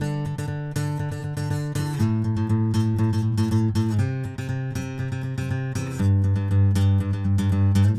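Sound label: Single Note Acoustic Riff, Guitars; Rock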